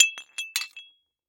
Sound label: Impact Glass Fall To Ground And Not Break